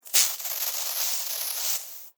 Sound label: Insect, Hiss, Attack, Spray, Bug; Animals:Insects; Insect Hissing; Blastwave